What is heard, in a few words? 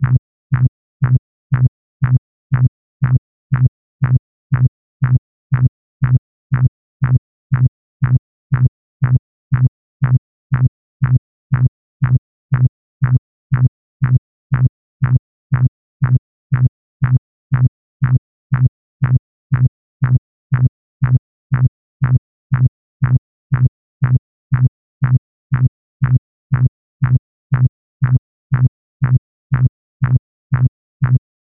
metronomic soft electroni, loopable